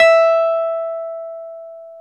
Wurly; Keyboards of The 60's and 70's

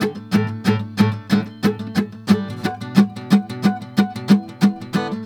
GUITAR, Balkanish Traditions

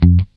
GUI F2, E-GUI MUTED; Sound & Vision; Gigapack I CD 2

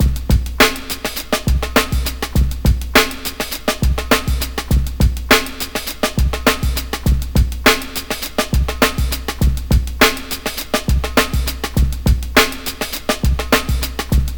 102 Original Drums, Breaks; Lockdown Breaks

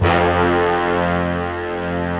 brass F1

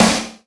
SD, Yamaha RY-10, Drums

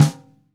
Radio King Snare mp Room; 1942 Radio King Snare One-Shots; Sonic Foundry (Sony Creative Software); Vital Drums

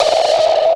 FUZZ CLAP, Drums; Distored Reality 1&2

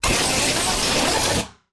Casio, Glitched, malfunctioning synth

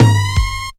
Noise; Zero-G Jungle Warfare 1, 2 & 3

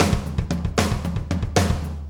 Tom Tom Beat Fill

